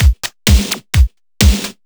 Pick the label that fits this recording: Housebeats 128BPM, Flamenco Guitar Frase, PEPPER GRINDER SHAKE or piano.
Housebeats 128BPM